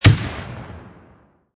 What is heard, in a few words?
M67 HE Grenade; Grenades; Explosions; VRSonic